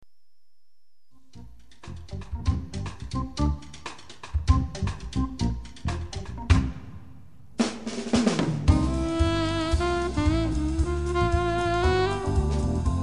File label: sax; World First WOODEN Alto Saxophone 100 Handcrafted